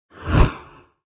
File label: tunnel in; Blind Drive